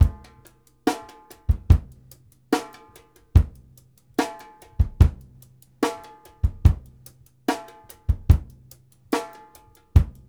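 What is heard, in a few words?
straight, drums; Sampleheads; New York City Drumworks VOL-1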